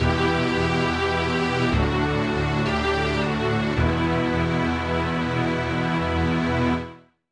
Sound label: BGM Failure; marvel super hero squad 2009 nds gamerip